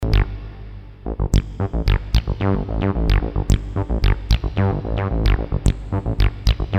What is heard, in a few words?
synth tb303, Alesis qsr synth